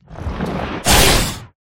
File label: bonus tyra melee; Recode; rampage riches